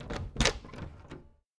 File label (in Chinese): 书店外黄昏 开门